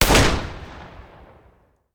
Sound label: Hand Gun; Sound Ideas; Guns